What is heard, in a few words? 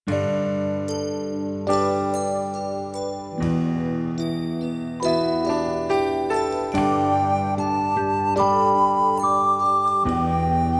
Ring Bells Ring Slow